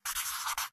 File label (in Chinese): 制图台绘制地图声